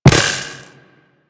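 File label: Web Projectile Land; Warframe; Corpus; Arachnoid Wraith; Abilities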